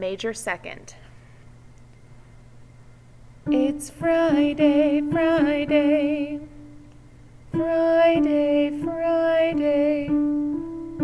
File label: Interval reference songs example